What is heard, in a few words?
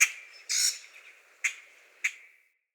Tree Birch Bird; Trees